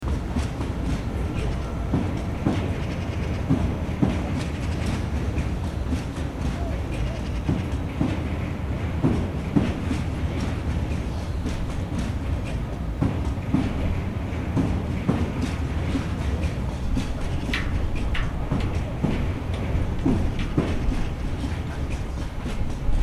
departing and arriving trains at hohenzollern bridge; koeln